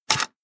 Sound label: click; CounttheCards; Fun games